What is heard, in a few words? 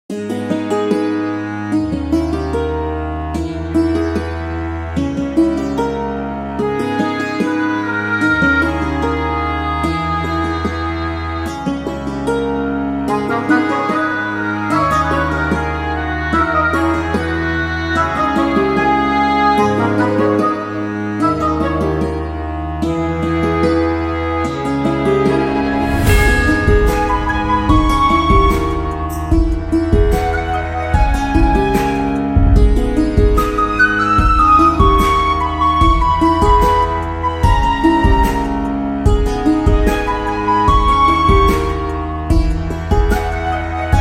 Medieval Bardcore Version